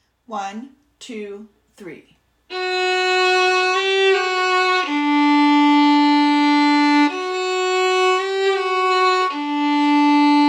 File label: Harmony